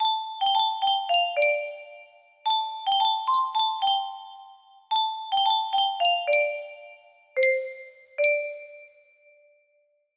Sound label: melody